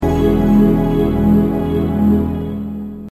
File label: Magic harp